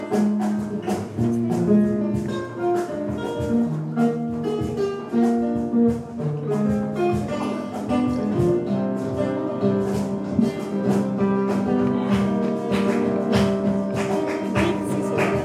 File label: Flamenco; Envigado